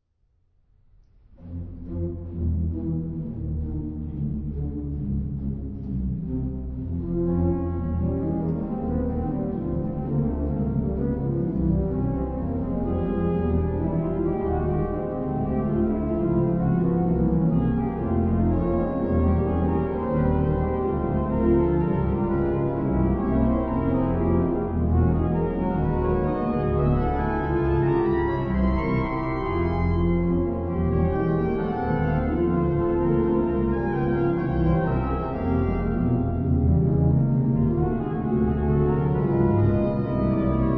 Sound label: bass ostinato